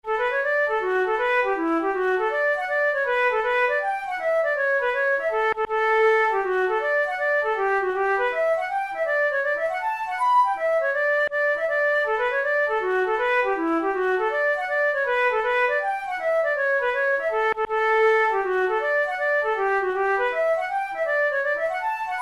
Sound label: hornpipe; Miss Carroll's hornpipe